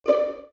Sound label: dial tone orchestra; Oppo R7